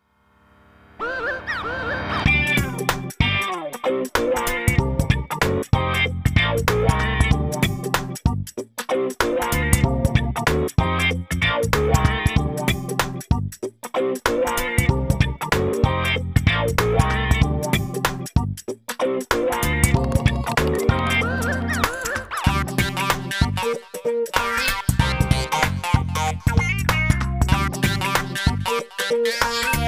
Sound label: Jazz cellar